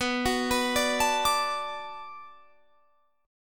D 6th B, chord

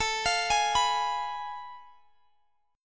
F Diminished A; arpeggiated

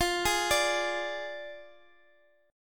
F Minor 6th, chord; Fm6; arpeggiated

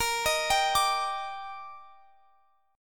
Eb Major 7th Bb

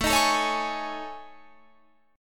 A Major 7th Flat 5th, down Guitar Drop D

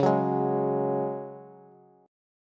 Db Suspended 4th Db, Bass; strummed

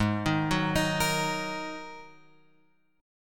G sharp 7th Suspended 2nd G sharp; G#7sus2 Chord {4 6 4 X 4 6} arpeggiated

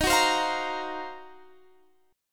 D sharp Minor 6th Add 9th; D#m6add9 strummed; 23,21,22,22,23,x; Standard

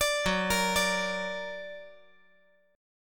F sharp Augmented F sharp; F#+ arpeggiated

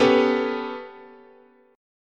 B 7th Suspended 2nd Sharp 5th, guitar chord

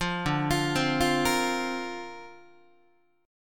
D-Minor 7th Sharp 5th D Guitar, chord; Dm7#5; arpeggiated

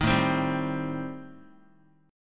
A Minor 7th Flat 5th A, Guitar; Am7b5 strummed; 6,5,3,5,x,x; 4A,4E,5A,5D,5G#,6C#

